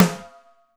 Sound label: Snare, Alesis DM5